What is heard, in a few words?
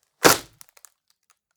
wood break short; foerster